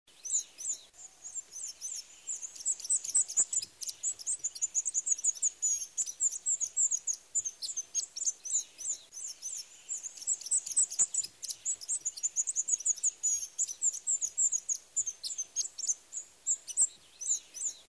Western Spindalis

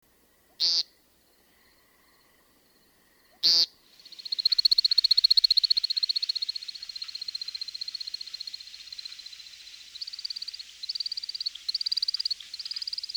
Broad billed Sandpiper

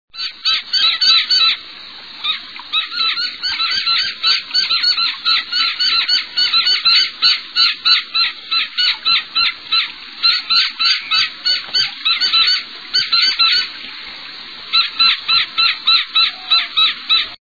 Gila Woodpecker, songs

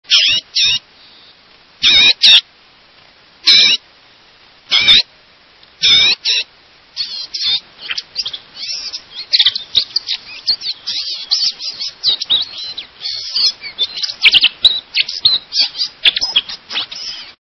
Blue gray Gnatcatcher

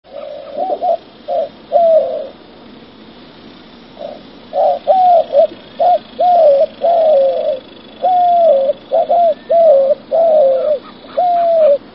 White winged Dove

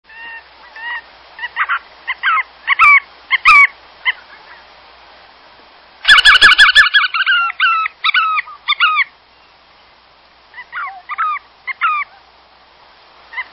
Bar tailed Godwit

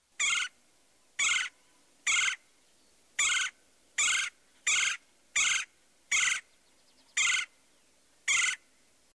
Red bellied Woodpecker

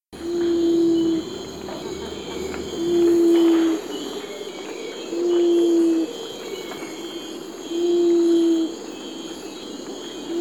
Ruddy Ground Dove

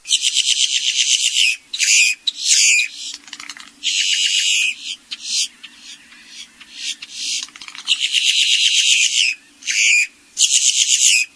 Boat tailed Grackle

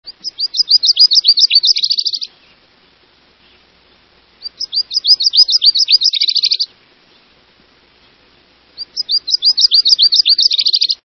Nashville Warbler, songs

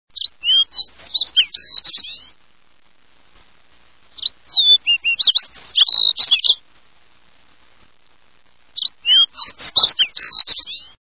Fox Sparrow, songs

